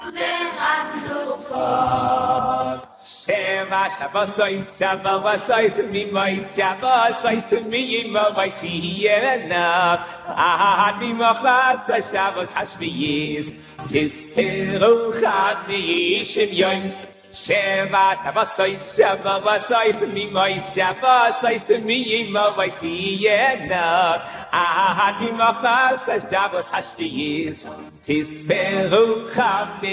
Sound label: vocals; phonicmind